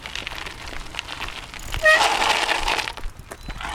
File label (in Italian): bici con frenata; Si sentiva anche il rumore dei sassi quando frenavo.